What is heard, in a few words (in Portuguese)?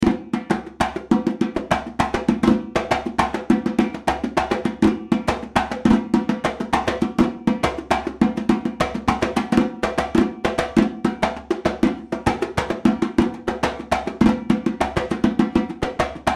timbal